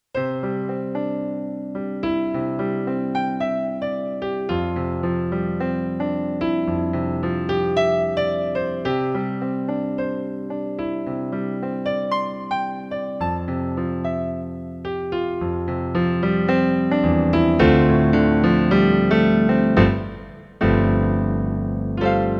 Piano; Pianobox

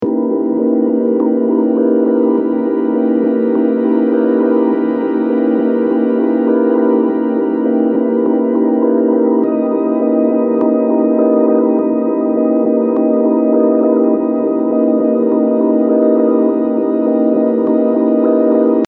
pad OUT

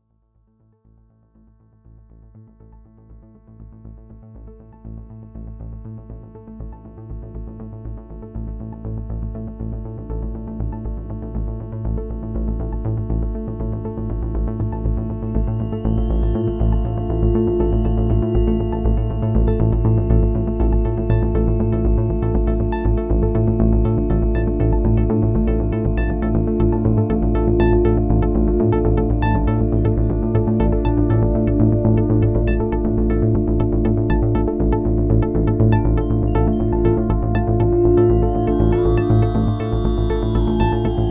A110 1VCO

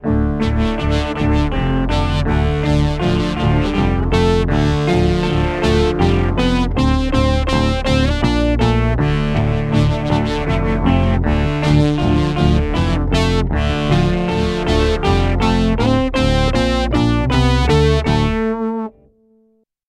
Boss SY 1 Bass

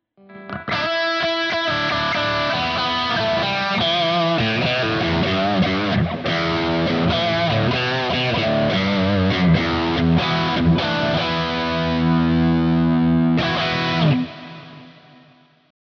MUSIC MAN L3 crunch 2nd position

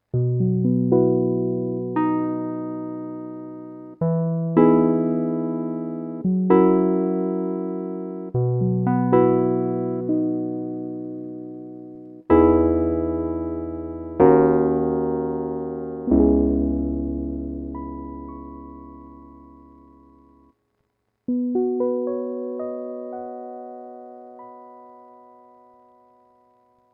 Midiplus Stage 88 E-Piano

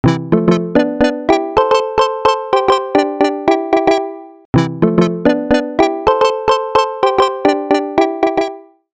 Sustained Plucks; Cherry Audio Mercury-4

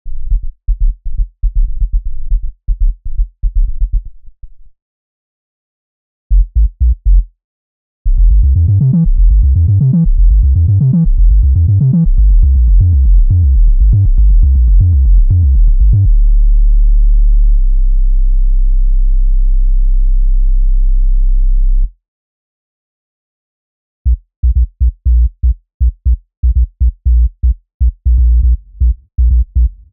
Sub Solo the Bass Force

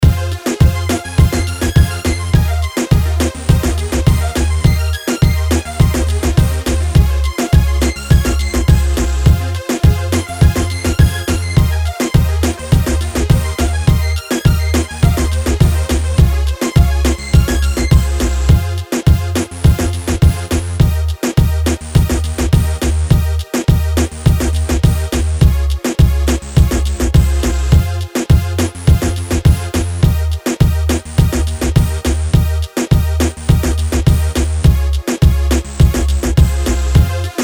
reggaeton

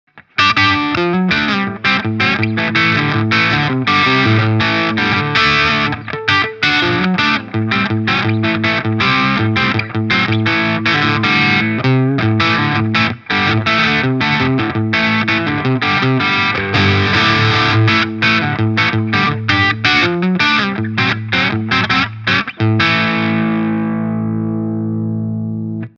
Orange Super Crush 100 Gain

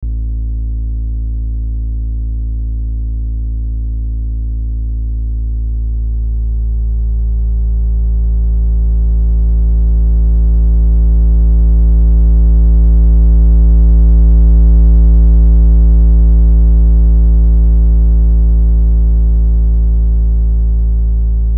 ComplxOsc Pure then Triangle No Timbre Mod; Arturia Buchla Easel V

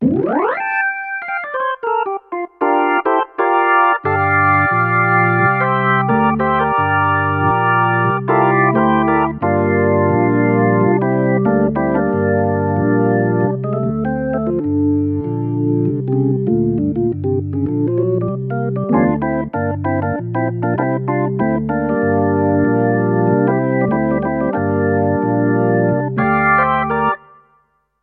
Organ

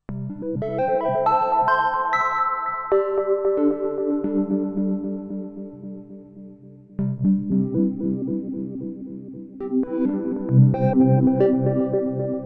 Dancing Bells

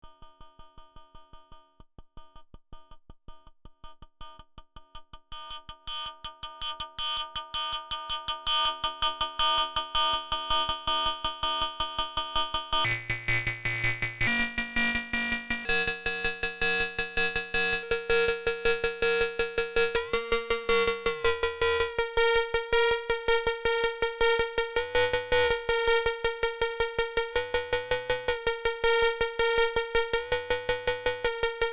Arpeggio Different Ringmod Algos

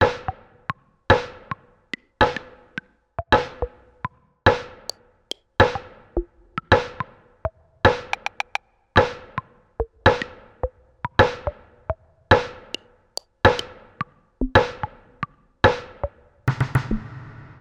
Sensory Percussion Blip Blop; Sunhouse

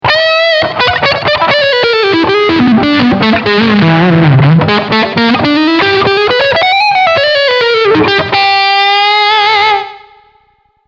Front SC Crunchlick

